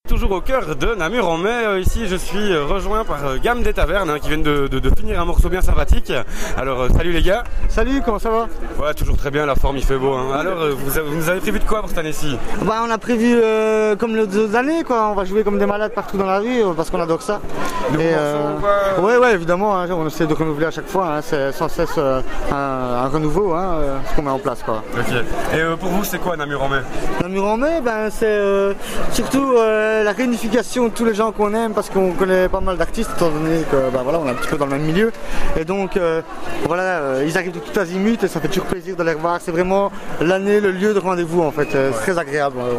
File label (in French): gamme des tavernes